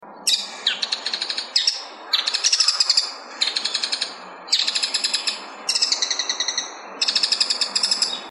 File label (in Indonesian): suara bull headed shrike